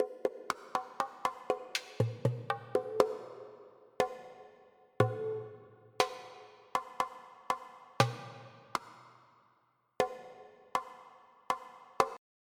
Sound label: Hi Conga